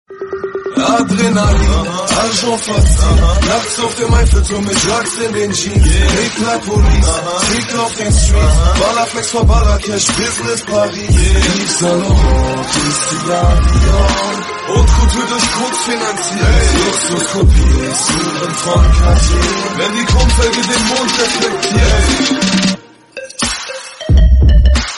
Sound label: black beat